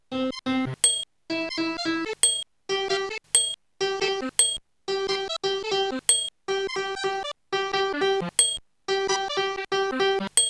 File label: sweep